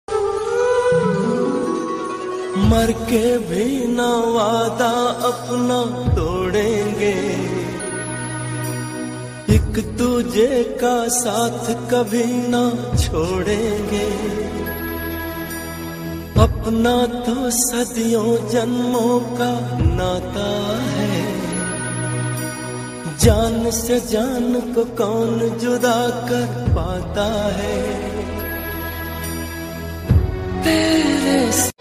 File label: Old Hindi Sad Song Ringtone